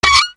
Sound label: SQUEAKS